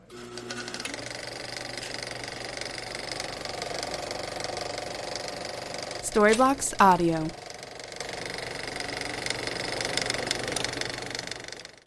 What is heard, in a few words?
35mm film projector start